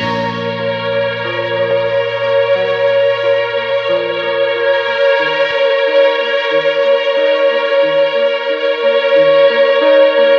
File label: keys piano, Sad Loops